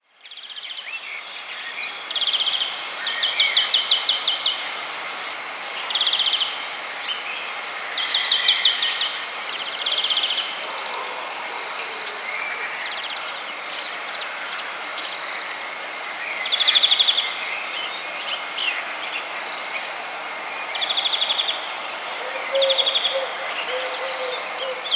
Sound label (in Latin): Chloris chloris; SETE